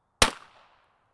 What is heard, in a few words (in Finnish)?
laukaus